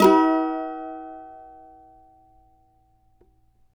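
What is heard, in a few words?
F minor, Guitars; Spectrasonics; Hans Zimmer Guitars VOL-2; CAVA CHORDS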